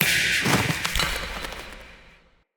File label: Potion Smash Bird Snap